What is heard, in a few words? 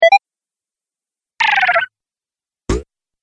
Videogame Fx; Sound Pack 1; Video Game Sound Effects